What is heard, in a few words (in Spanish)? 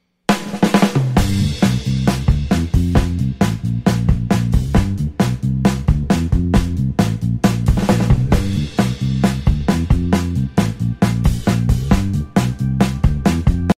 Sonido de Batería; Sonidos de Instrumentos Musicales